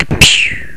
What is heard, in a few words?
random launch; Macworld (1998-10); Games World; Shareware Games; Arcade; idin 2.0